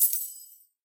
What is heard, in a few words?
win low; Rabcat; robin Of Sherwood Online Slot